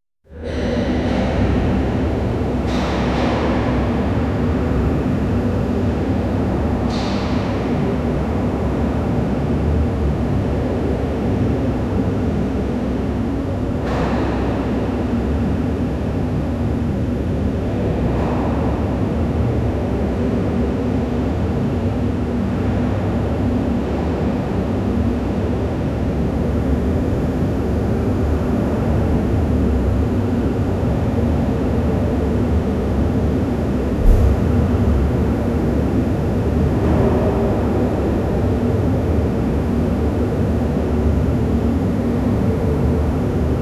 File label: empty hospital corridor